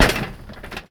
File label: metal grate, footsteps